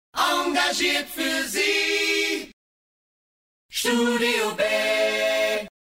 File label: Acapella; DRS 3; studiob